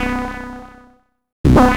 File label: Cwejman Lead, EDM; Sonic Foundry (Sony Creative Software); Afterhours EDM; Session 10 - Come In Hard